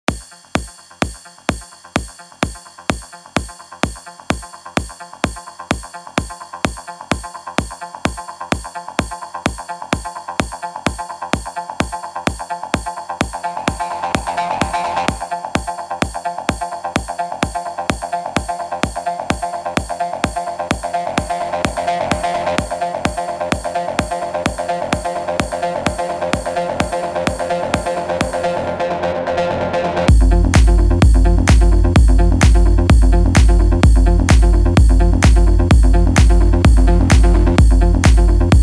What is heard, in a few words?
Saxophone Version